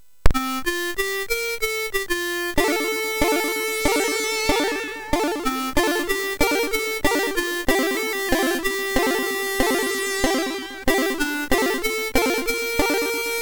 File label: Buuhuu, MOS6581R3; hvsc